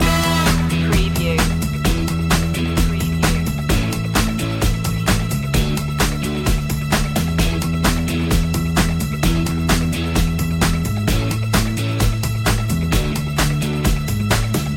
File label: re rock funk loop